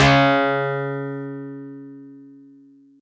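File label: Easy Clav DX100 C#3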